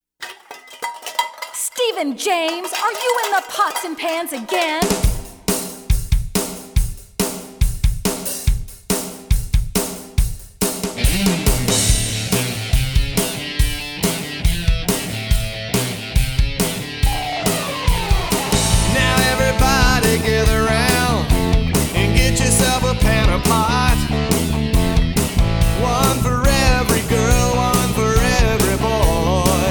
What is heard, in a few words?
Pots n Pans Rock n Roll Band